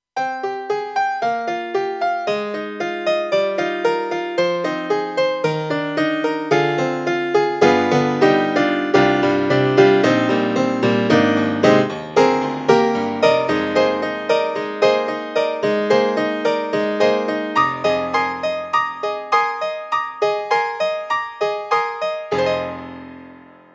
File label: AKAI SG01p, LIGHT PIANO